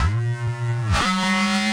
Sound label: 138 A#, alarm-fx, Techno; atmo+fx-loops